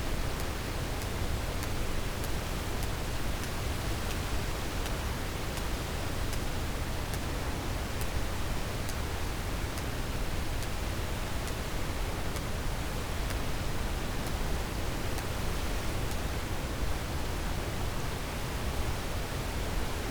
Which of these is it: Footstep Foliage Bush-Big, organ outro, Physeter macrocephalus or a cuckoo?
Physeter macrocephalus